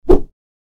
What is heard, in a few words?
whoosh